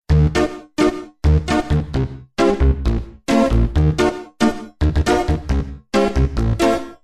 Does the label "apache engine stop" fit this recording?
No